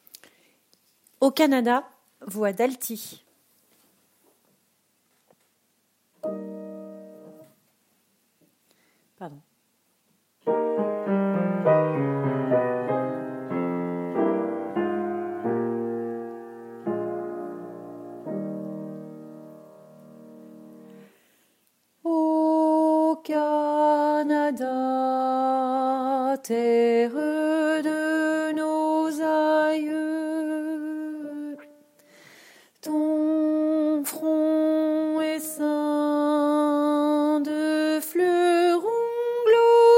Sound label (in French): hymne canadien altis